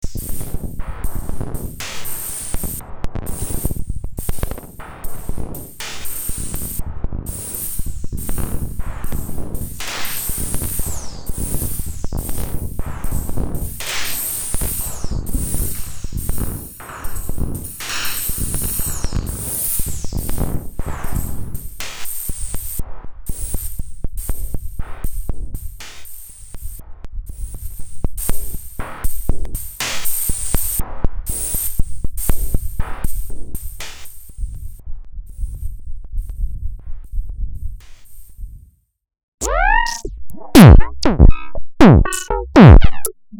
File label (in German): fiese beat